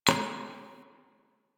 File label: Idle Heavy Hoof Stomp; Ambience; Warframe; Entrati; Tagfer